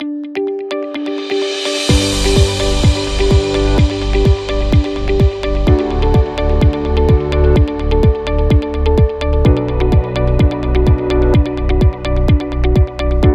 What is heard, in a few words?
Corporate Real Estate